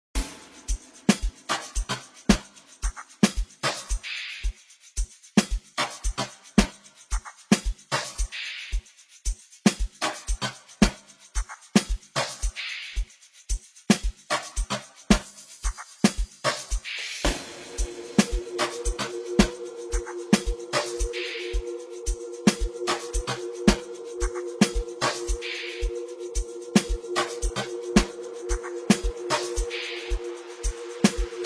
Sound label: Drums Bells Mix